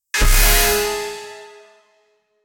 anime 7 multiple slash, thin

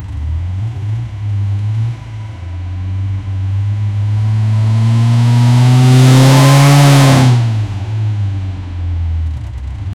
acceleration of a citron, 2cv

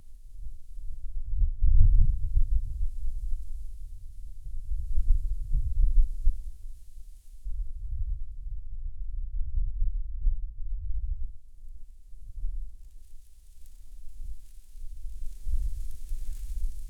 wind subtle creepy grass; ambience

